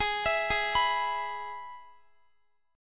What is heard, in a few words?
Ab Minor Sharp 5th chord, Guitar; Abm#5; arpeggiated; x,x,20,21,20,19